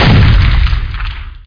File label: Supergun; SpecOps2 MPlayer Demo